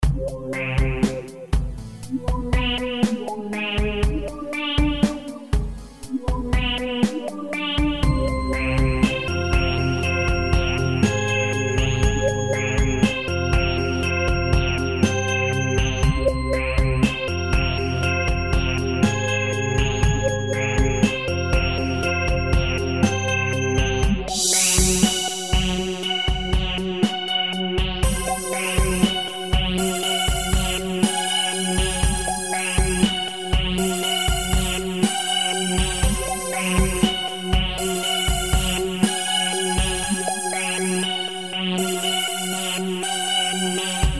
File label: Musicbox